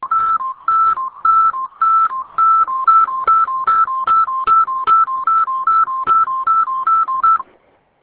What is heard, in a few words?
Alerter, trains